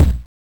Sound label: Kick, Genesis; Sonic 3; Launch Base; M8